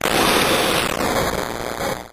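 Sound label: Computer Bit Crush Data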